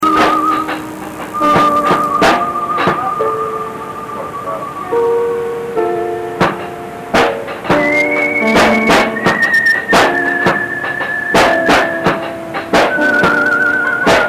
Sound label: slow slide